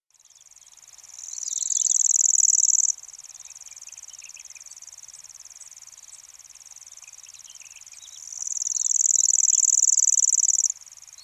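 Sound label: Lanceolated Warbler; makinosennyuu; hokkaidou; koe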